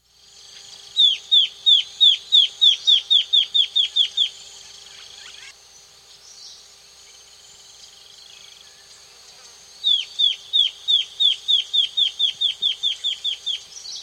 Bare-Crowned Antbird, S. America

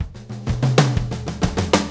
DRUM TOOLS

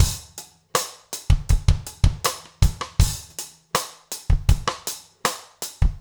trip hop rims

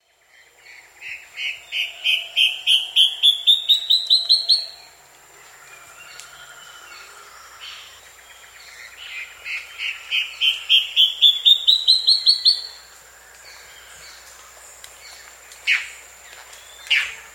Saturnine Antshrike; S. America

